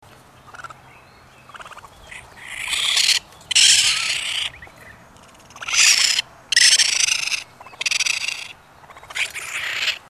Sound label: baby, raccoons